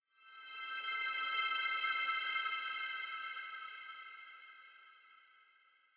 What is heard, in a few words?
Mountain Music, Rhythm Temple Music Success Stinger Louder